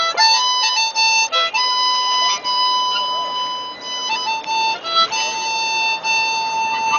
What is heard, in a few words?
Harmonica